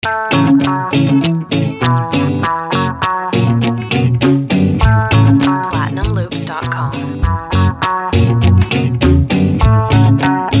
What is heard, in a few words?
ska guitar loops voice duck